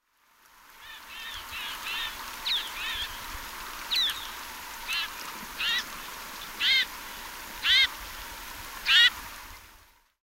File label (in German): schneesperling